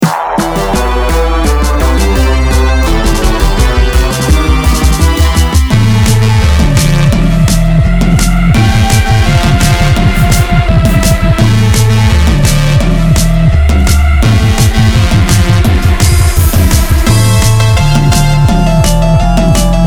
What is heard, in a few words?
battle